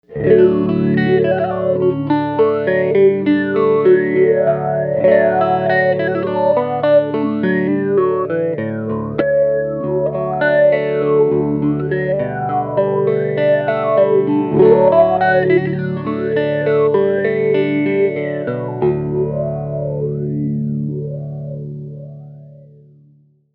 formant, mobius

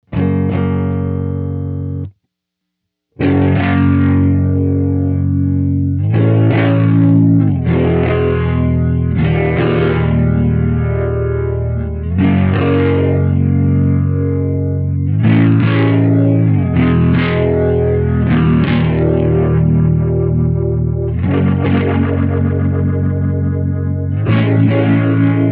rotary; mobius

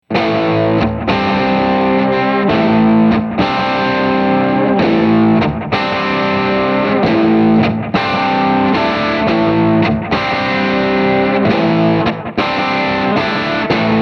analog; dbucket

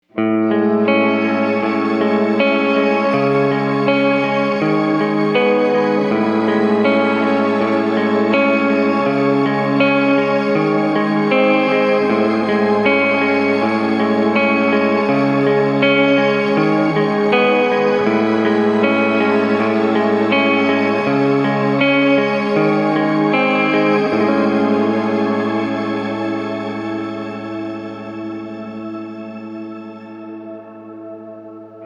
shimmer; BigSky

